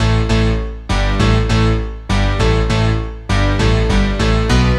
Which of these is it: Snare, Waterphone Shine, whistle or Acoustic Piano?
Acoustic Piano